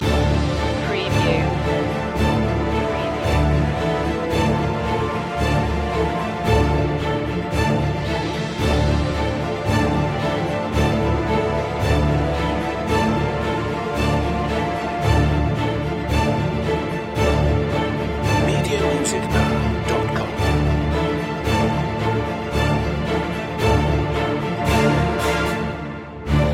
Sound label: no choir no perc